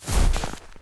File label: snow step, Footsteps; civ13; civ14